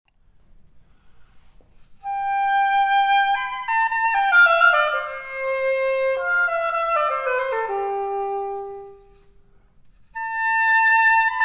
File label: oboe; italian in algiers